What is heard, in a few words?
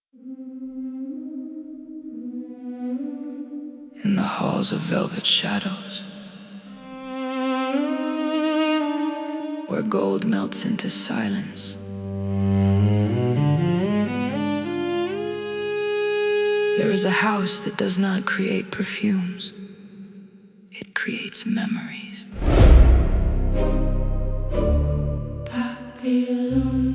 music